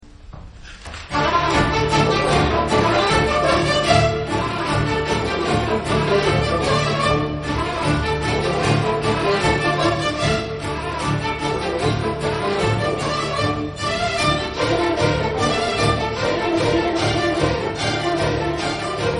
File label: tambourin, baroque; Rameau